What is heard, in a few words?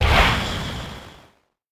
rocket launcher; factorio; Factorio 0.14.21; fight